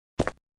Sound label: put item